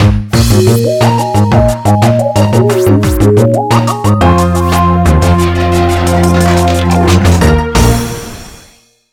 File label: Jingle Lose; UNO DSiWare